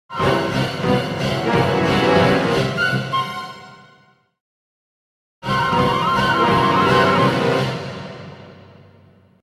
Music logo - Comedy orchestra, with psycho strings x2; Hollywood Edge Sound Designers Tool Kit